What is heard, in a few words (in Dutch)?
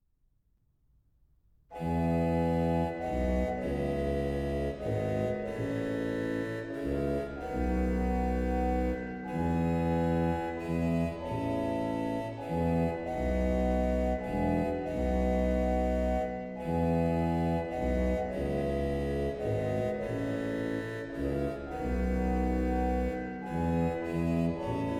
Koraal, organ; vollenhove; Bolt; Nederlantsche GedenckKlanck